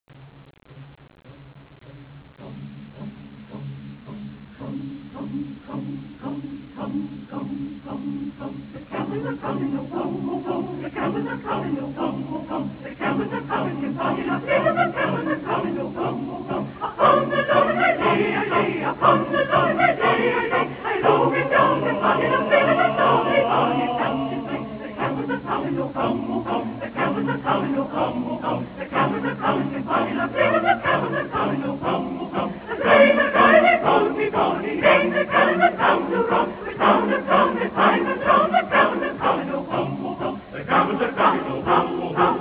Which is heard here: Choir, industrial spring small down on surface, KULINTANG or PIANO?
Choir